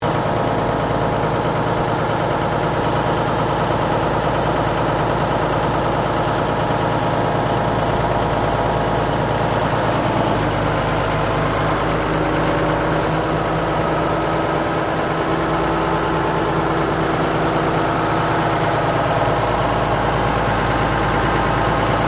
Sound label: Truck Military M816 Wrecker Idle High, Tank Transport vehicle; Trucks; Vehicles